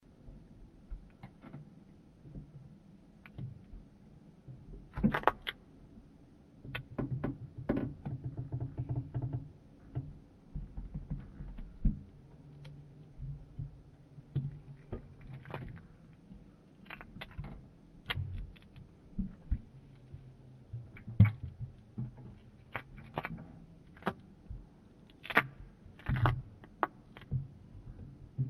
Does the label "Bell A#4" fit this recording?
No